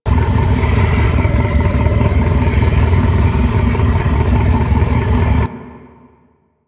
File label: Gas Pipe Mach Loop; Ambience; Warframe; Corpus Gas City